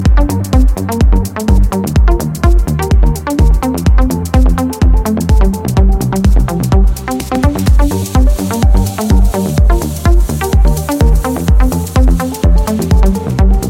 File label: Bass